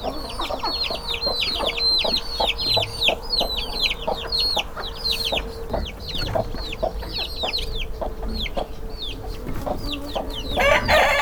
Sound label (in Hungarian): kotkodákukorékolás röffentések, csibe magyar tyúk; állathangok; paraszt udvar